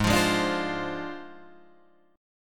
Ab 6th Flat 5th Ab down Guitar Drop D, Ab6b5 chord; Ab6b5 strummed; JGuitar